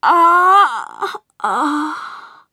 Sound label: death